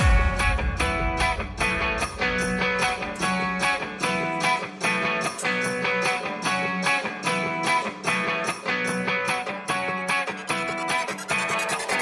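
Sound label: electro guitar ringtone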